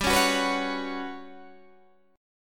G Major 7th Flat 5th, Guitar, DADGAD

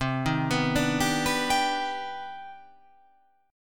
C Minor Major 9th, chord; down; chord shape

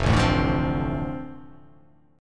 F 6th F down, chord; F6 strummed

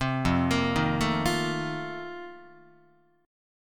F 11th F, down, Guitar; F11 arpeggiated; 3,1,1,1,1,4

